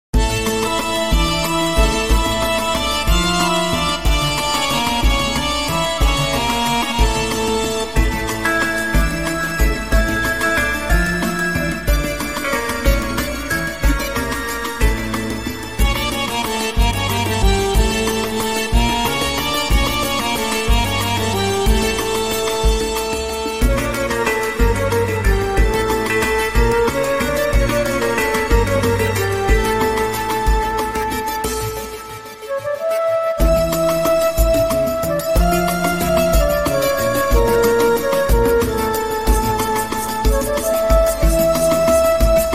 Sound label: Melody